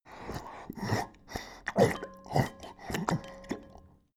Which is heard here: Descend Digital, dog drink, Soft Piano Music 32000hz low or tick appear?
dog drink